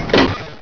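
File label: switch; Pro Android Games; Wolf3D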